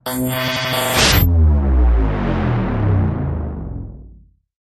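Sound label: Power Down Circuit Bend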